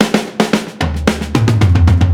Drum Fill Transition

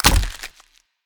Egg Hit; we are the dwarves gamerip 2016

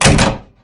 Open door

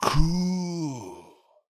Fall; Kwix

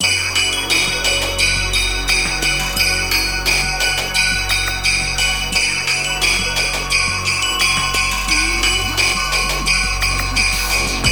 Bgm Stage machinas

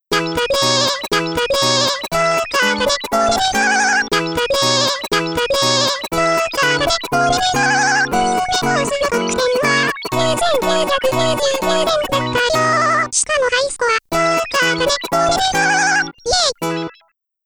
Reward Song

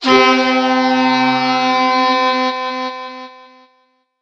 Fishmen Horn; we are the dwarves gamerip 2016

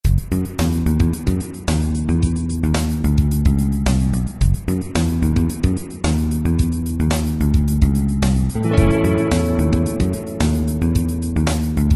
drum bass; otome the exorcist

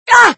Fem Pain; Extra Lives

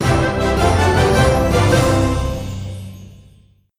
victory jingle; Spirit Roots

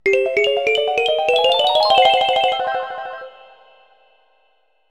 Instrument Refill; donkey kong 64

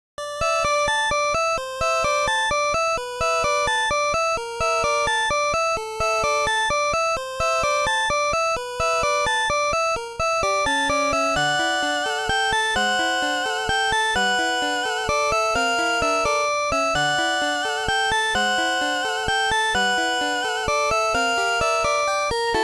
Lounge BGM; Outside the Ship

